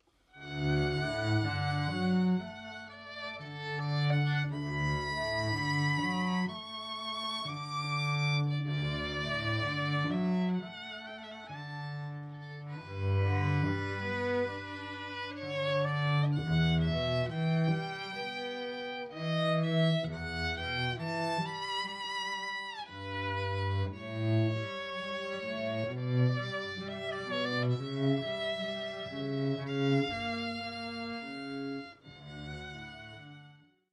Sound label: Duo Méditation from Thaïs, string ensemble; Just Divine String Ensembles